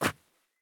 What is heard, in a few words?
jump wood; Escape from Tarkov